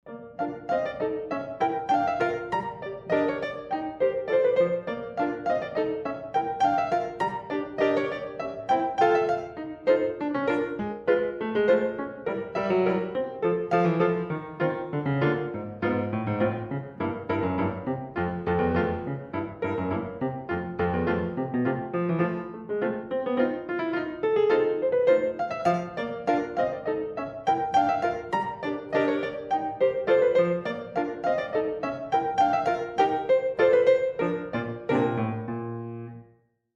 Polka

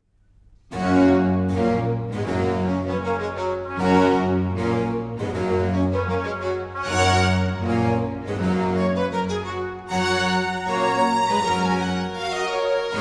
Concerto Grosso G-dur - A tempo giusto

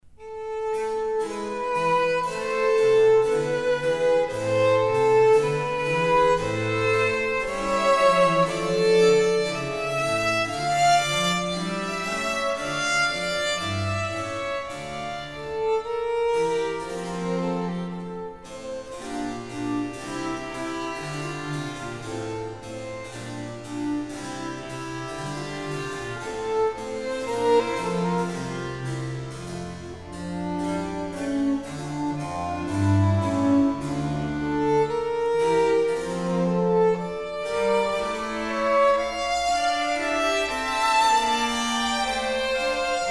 Sonata da camera - Grave-Adagio

